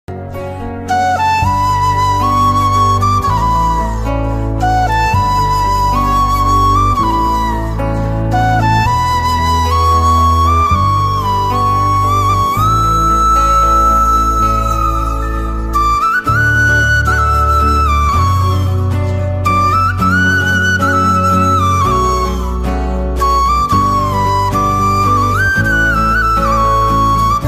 flute cover ringtone, flute heart touching ringtone instrumental